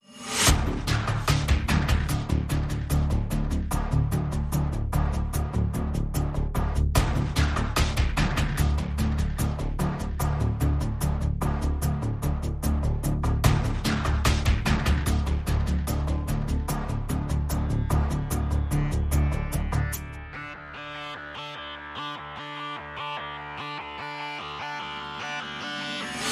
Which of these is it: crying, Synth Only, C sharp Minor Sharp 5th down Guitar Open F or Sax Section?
Synth Only